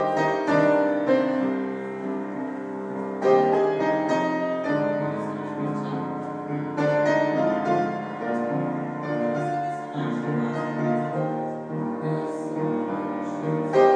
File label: pianist, Lake Bled